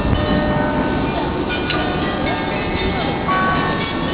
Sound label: carillons, bell; groningen